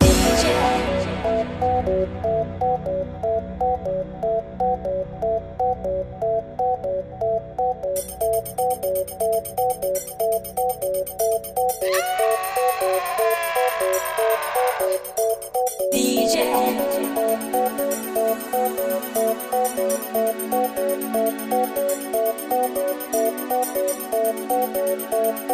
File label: ambient